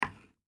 Deodorant Set Down, Deodorant Place In Bathroom Cupboard Set Down; Household; Primping